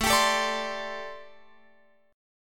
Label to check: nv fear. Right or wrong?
Wrong